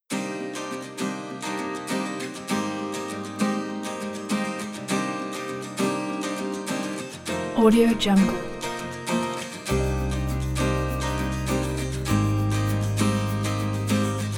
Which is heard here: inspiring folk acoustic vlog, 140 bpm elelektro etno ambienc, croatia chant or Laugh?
inspiring folk acoustic vlog